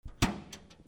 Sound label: CLICKS